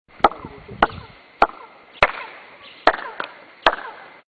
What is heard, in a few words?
echo; Tikal